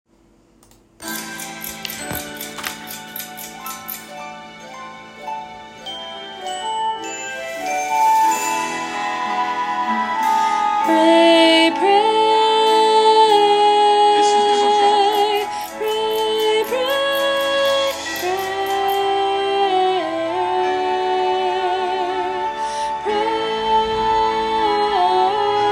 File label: Alto; Fame The Musical - Holiday Pack